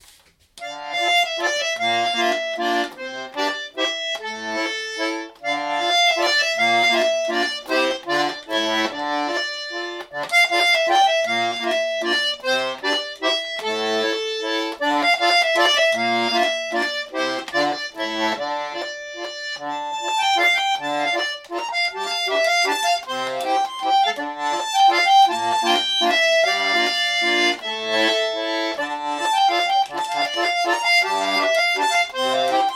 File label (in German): akkordeon PRECIOSA walzer 440Hz